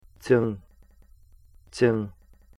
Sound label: djing